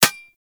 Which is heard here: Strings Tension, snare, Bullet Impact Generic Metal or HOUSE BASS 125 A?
Bullet Impact Generic Metal